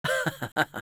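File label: Spy laugh short, Spanish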